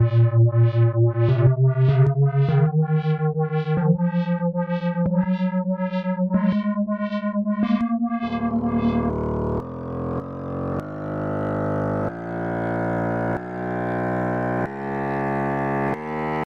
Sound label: B Flat Minor scale